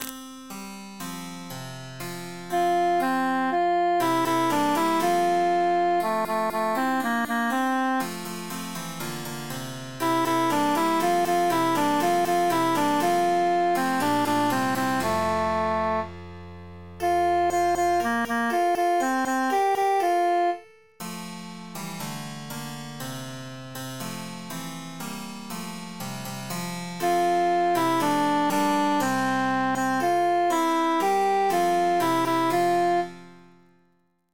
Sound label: altus emphasized; psallite unigenito